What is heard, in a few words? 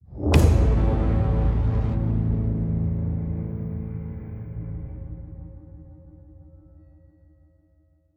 Shock Stinger; batman the enemy within